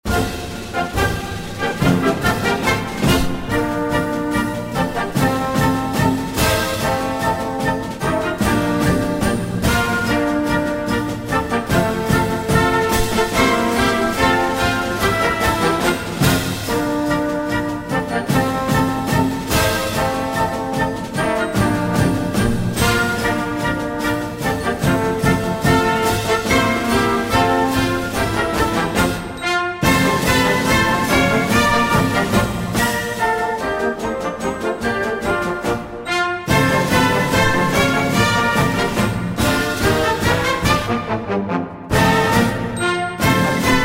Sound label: vivo galop; glover